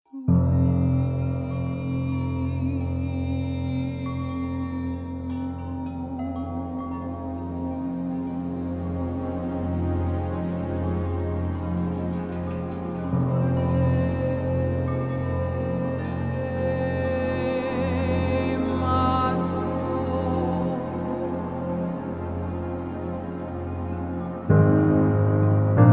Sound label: emotional piano; tonehammer